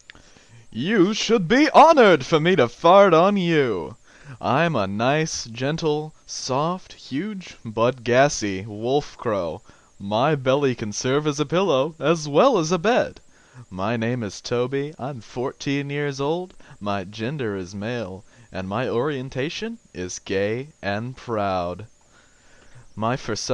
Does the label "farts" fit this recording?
Yes